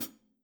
Hihat Foot Soft

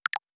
End Calibration; Standable Full Body Estimation

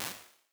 Early Reflections Wood Floor; Impulse Responses; Ableton Live 12 Lite

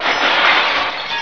Whiplash

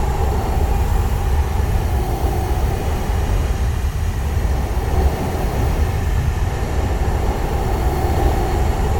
Summit Slipstream Loop Quad; Journey

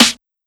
Snare Strong, drums

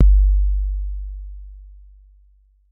Kick 606 Mod

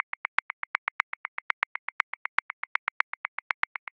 Dancehall Perc Accent 16ths 101 bpm; Grooves; Ableton Live 12 Lite; Core Library

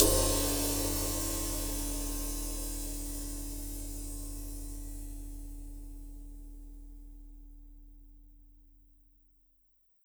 Ride Brush Hit Hard Club Sizzle; Drums

